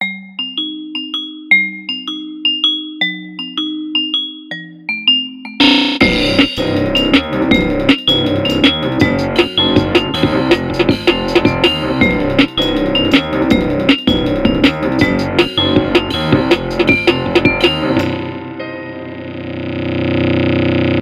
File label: Toy Jingle; The Healer; watch dogs unofficial soundtrack gamerip 2014